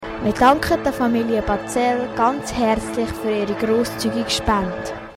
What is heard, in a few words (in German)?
Jingle; Bazzell; Hünenberg